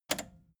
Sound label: record player repeat click fast